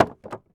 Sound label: IMPACT Wood Plank On Wood Pile Short; mono; IMPACTS; Universal Sound FX